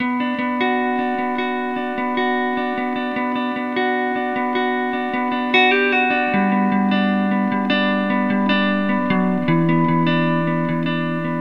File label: Guitar Hum Comb Filter